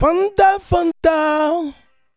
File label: vocal riff Eb maj